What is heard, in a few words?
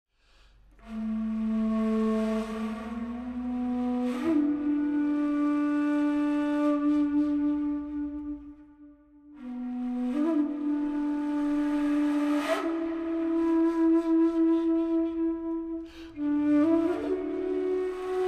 Flute; LEGO; Ninjago - Masters of Spinjitzu; Sensei's Flute